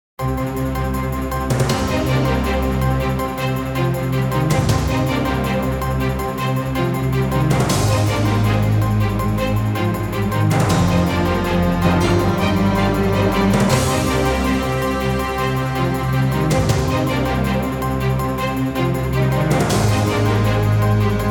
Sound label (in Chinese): 宣传片背景音乐